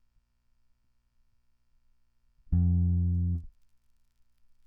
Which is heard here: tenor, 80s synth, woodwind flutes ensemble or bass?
bass